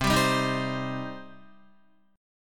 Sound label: C Minor C down Guitar; strummed; JGuitar